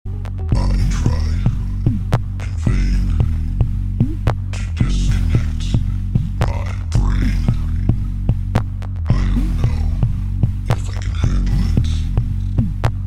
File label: Disconnect